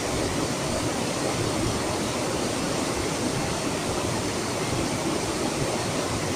loop water

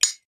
metal

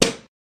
wood hit

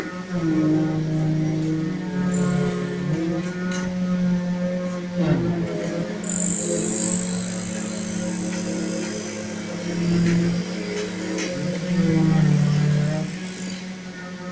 loop construction site

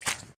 footstep wet

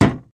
wood hit

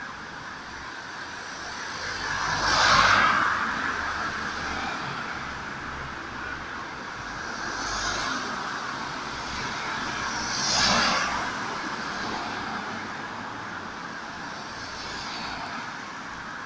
loop highway

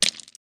stones

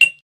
glass

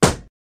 hit

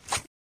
footstep

